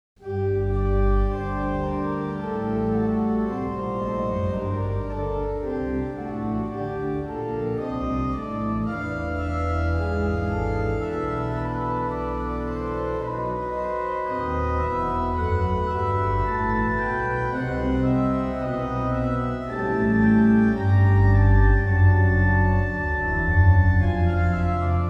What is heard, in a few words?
Distorted sorrow; Tales of Arise